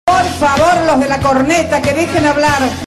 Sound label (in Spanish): corneta